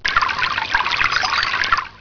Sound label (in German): fluss